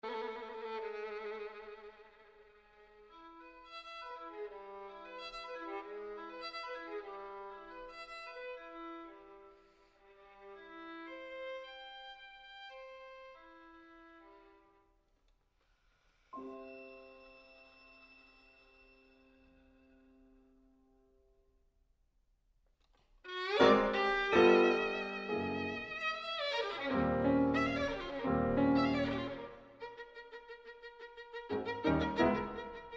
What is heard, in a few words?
Violin Sonata in G Minor, Intermede Fantastique et Leger